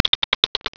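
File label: squirrel; Tiny Town; Education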